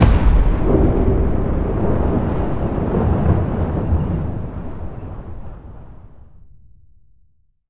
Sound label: transition To Free Spin Intro; netent